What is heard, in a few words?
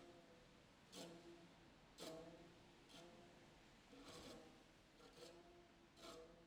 strange instrument